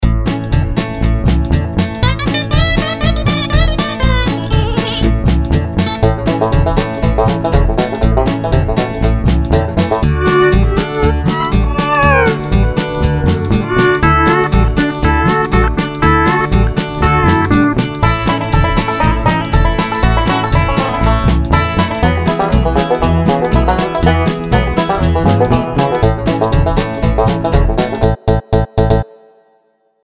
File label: alt mix; Chicken Run